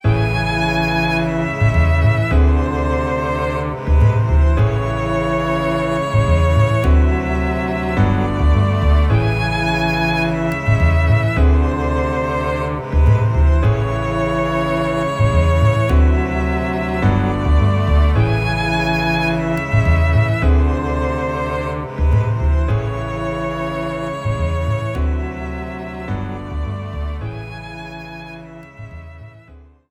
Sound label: BGM Salon Res Lose Western; Clubhouse Games: 51 Worldwide Classics